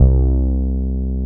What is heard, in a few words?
Korg POLY800 C1; Back In Time Records; Korg Universe VOL-1